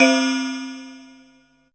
Synth Bells; Dream Zone

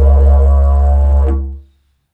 Didgeridoo Kit Samples

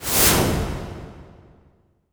IMP WIPE, Cinematic Impact

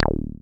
303 Short Release; Zero-G Analog To Digital 1 & 2

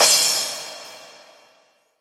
Cymbals Crash; Vengeance; Essential Clubsounds Vol.2

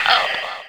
Fx Vox; Equipped Music; Loungin House

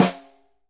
SNR, Synclavier Percussion 2 - World & Orchestral

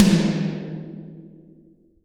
toms, Real Mega Drums

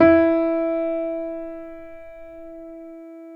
PNO MF; Synclavier Sampler Library

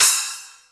Misc Cymbal

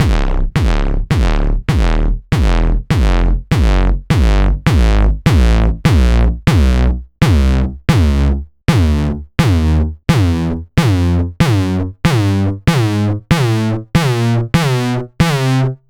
909 Grime Layer; Mbase11

